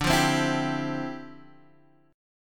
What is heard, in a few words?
D Minor 11th D down Guitar Half Step Down